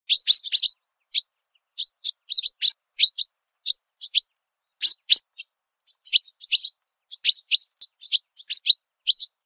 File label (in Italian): Fringuello gruppo femm